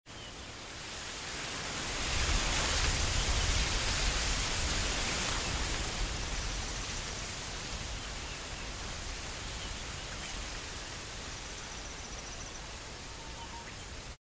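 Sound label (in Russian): порыв ветра в тростнике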